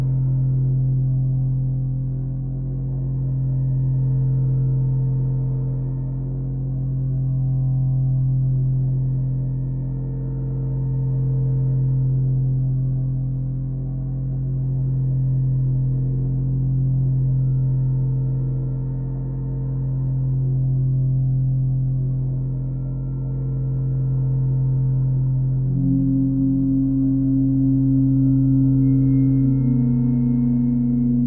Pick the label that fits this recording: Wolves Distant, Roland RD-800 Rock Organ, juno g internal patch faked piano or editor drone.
editor drone